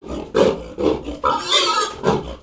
Pets Warthog Ecstatic; creatures; Lego

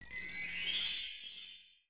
Armor Polish

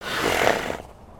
horse idle

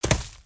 Grass Landing, footsteps

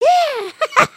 dance; emotes

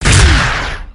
Shot

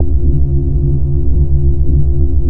Forcefield Gate

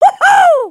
celebrate

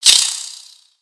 Spider Attack Ranged; Lego; creatures mechanical

